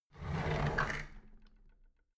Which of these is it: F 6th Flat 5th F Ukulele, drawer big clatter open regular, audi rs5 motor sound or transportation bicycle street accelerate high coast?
drawer big clatter open regular